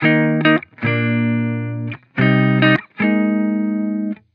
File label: Vibrations Pop Guitar Loop 110 BPM D# Maj; Cymatics; Vibrations Guitar Sample Pack